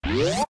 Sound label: Door Opening; Metroid (USA)